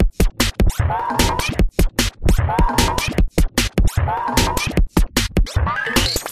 Jungle Loop Vox

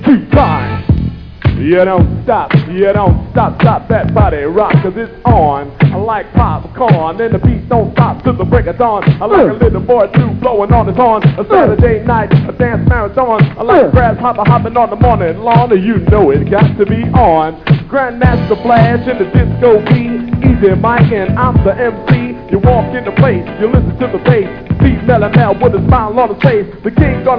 Old School Hip Hop

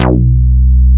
Moog Bass Hit

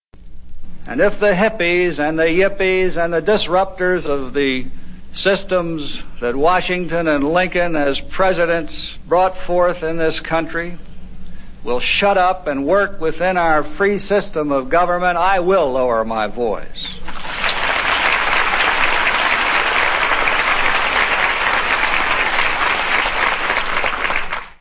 Male Vocals

